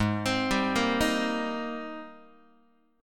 Ab Major 9th Ab Guitar; AbM9; arpeggiated

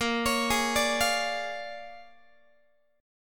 A sharp 7th Sharp 9th, Guitar; A#7#9; arpeggiated; x,18,16,18,18,16

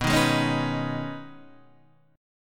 B 6th Add 9th B Guitar DADGAD, chord

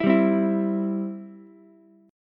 C Major, C, down, Ukulele; strummed; chord; JGuitar

